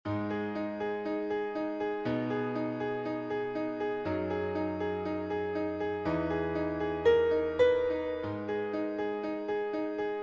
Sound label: Fire Piano